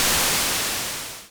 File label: splash; Republika Exhibtion Games; luftrausers